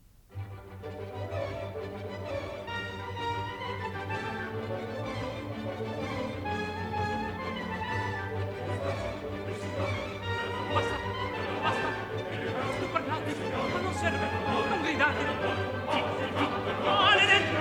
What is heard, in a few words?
Coro